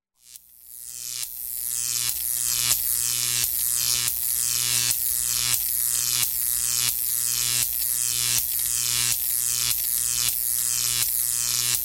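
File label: zvuki toka, electric current sounds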